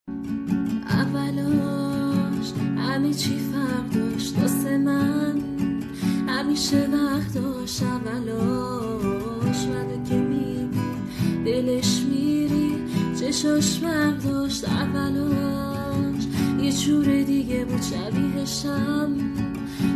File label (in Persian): ba sedaye zan